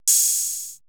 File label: 80S DRUM SET